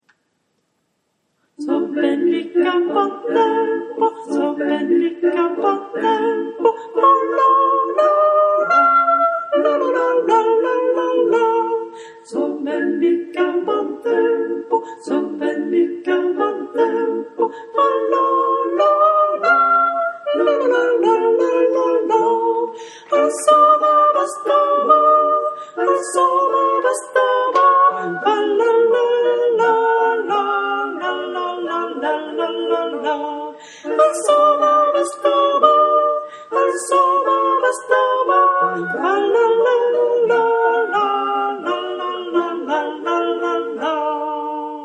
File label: sopranes; So ben mi cha bon tempo